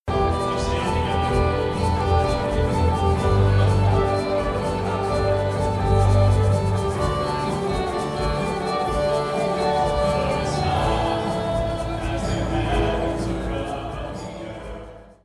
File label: Solo